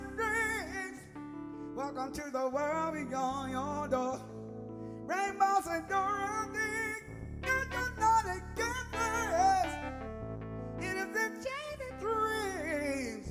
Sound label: Stereo Mix; MyMix Recordings NAMM 2018